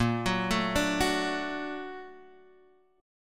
A sharp Augmented Major 7th A sharp Guitar Half Step Down